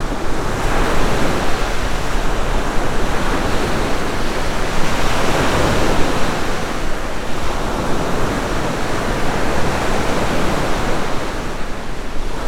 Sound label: ocean; avant gardens